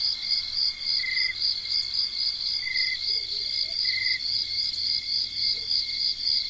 ambience night crickets; everness

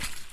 mud footstep; everness

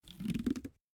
bow load; minetest x bows

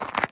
ice hit; everness

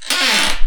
creaky door open; everness

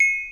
crystal chime; everness

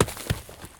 gravel place; everness

